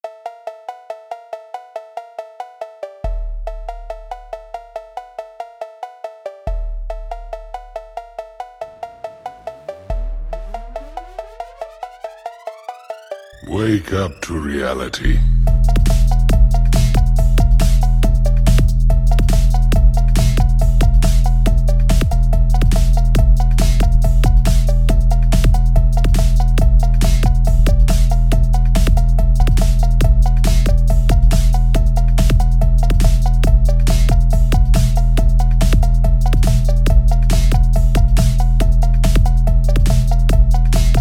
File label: phonk beat